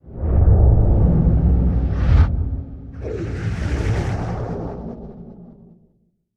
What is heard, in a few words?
Pylon really far; Barotraumatic